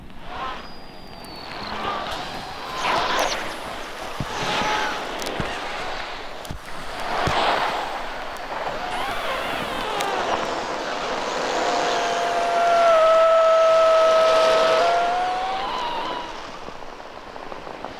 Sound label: hydrophone recording of burning embers underwater; soundscapes